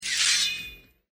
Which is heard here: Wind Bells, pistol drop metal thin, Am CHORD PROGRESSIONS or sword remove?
sword remove